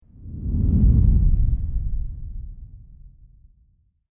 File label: whoosh